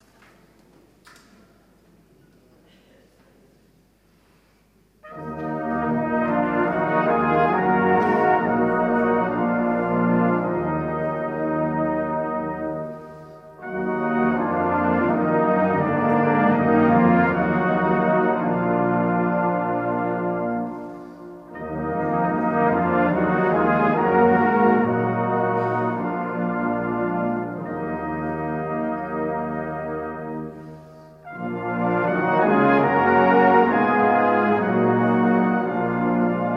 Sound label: Choral